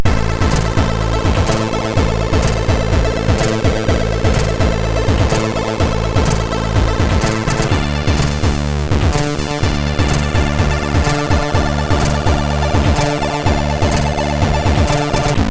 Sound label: Check Sound, MOS6581R2; sid